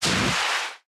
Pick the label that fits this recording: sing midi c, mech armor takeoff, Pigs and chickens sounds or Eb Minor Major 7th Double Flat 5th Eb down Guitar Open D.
mech armor takeoff